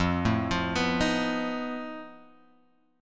F 7th Flat 5th F down Guitar Open G

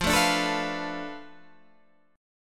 B Minor 7th Flat 5th F, guitar chord

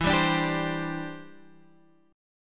E Minor 7th Sharp 5th

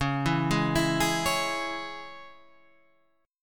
C sharp Minor, guitar chord; C#m Chord {9 7 6 9 9 9} arpeggiated

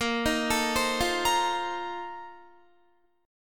Bb Major 7th Suspended 2nd Suspended 4th, Guitar; arpeggiated

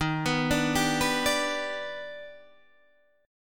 Eb Augmented Major 7th Eb, Guitar DADGAD; 13,14,12,12,14,12; down

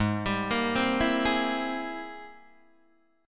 G sharp Augmented Major 9th G sharp Guitar Open D

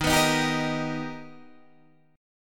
B Augmented Major 7th Eb, chord

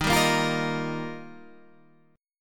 D sharp Major Add 9th

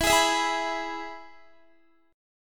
E-Major E, Guitar; strummed; x,19,20,21,20,19

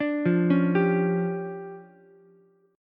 D Minor Major 11th, down, Ukulele, C6; DmM11 arpeggiated; JGuitar

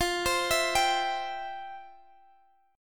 C Major F; arpeggiated

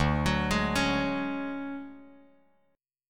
D-Major Sharp 11th, D, Bass Standard; arpeggiated; JGuitar

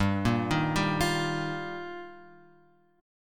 G Minor 7th Sharp 5th; Gm7#5 Chord {3 1 1 0 X 1} arpeggiated

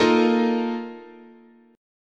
Bb Major 7th Suspended 4th, chord, guitar

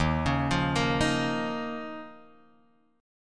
Eb 7th Suspended 2nd Eb, Guitar Drop D

